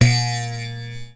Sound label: boing